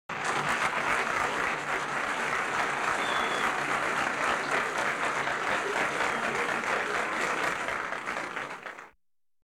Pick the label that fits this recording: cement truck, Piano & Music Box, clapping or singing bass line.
clapping